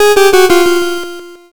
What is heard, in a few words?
lose